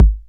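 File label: bass drum 808 Sat Mid Decay A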